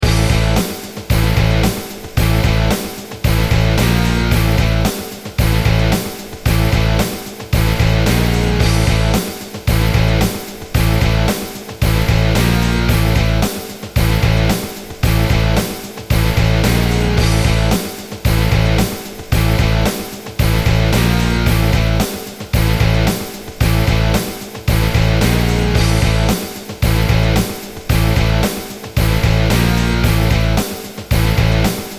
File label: action, Music Loops; Music Loops Pack Bundle